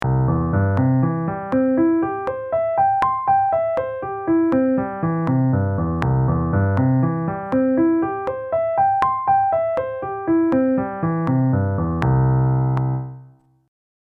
with metronome

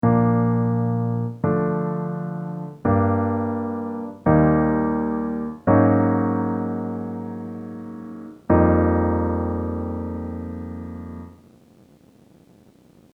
Chord Progression Bb

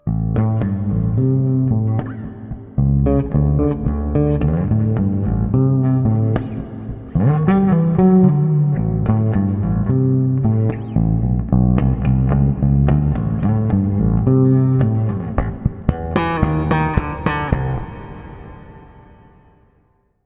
plate reverb

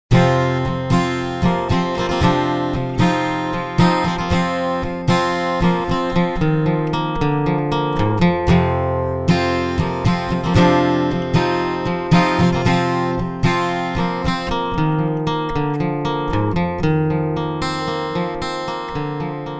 Piezo mic with external mic

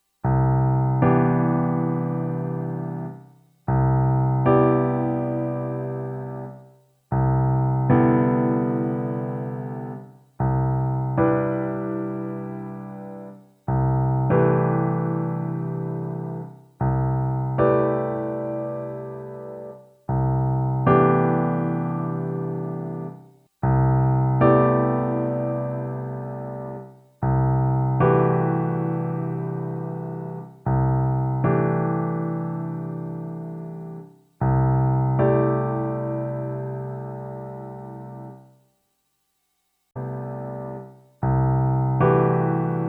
C Major rootless

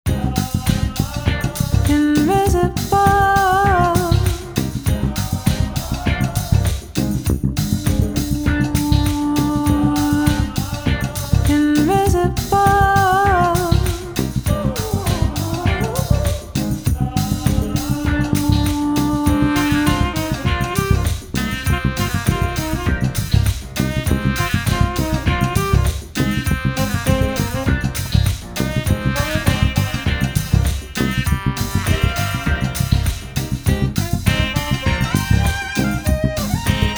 Fills NO FILLS

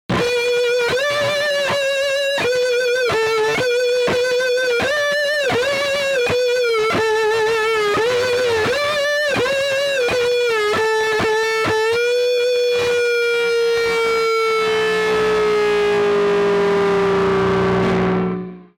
Ghostly Slide

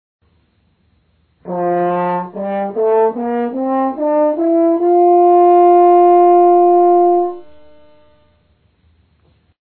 F single tube tone

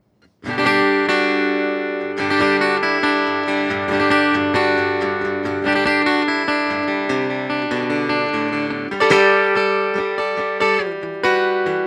Yamaha FGX5 Test Pickup

